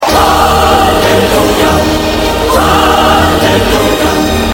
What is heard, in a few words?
Hallellujah